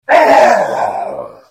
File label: Hit; The Elder Scrolls III - Morrowind